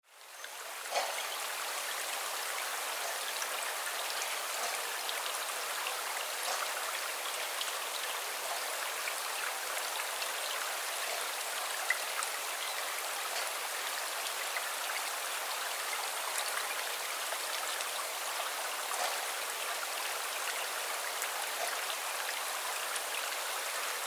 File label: monkeys; alishan